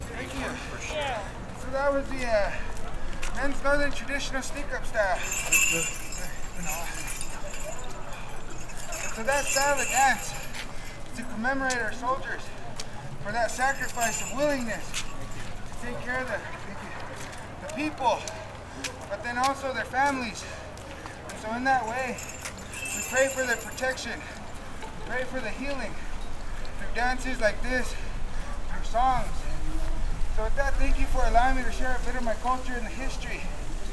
Santa Fe Indian XY

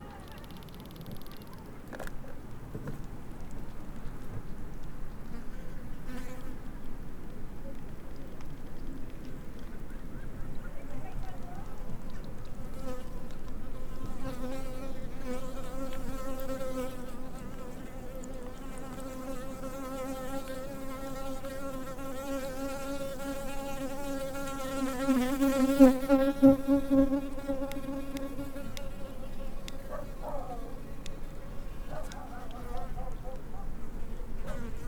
Playa Balandra Crustaceous sounds

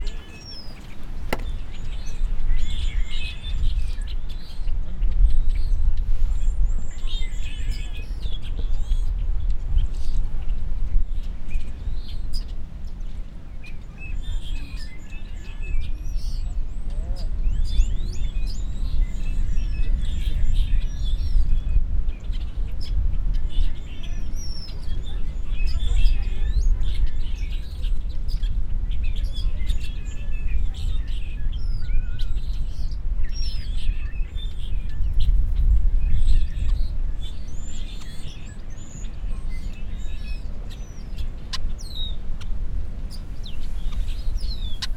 Lovers Point Birds close up

